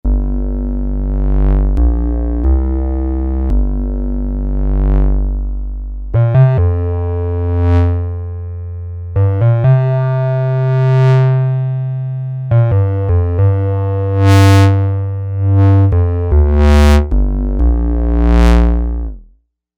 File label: ClaWoWinet, Novation UltraNova; Factory Patches